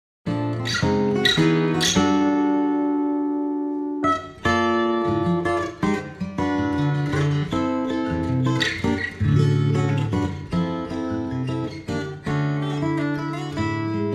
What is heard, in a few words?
Samba